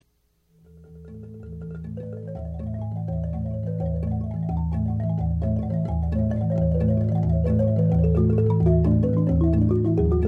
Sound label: balafon